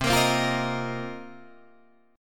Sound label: C Minor 11th C Guitar Drop D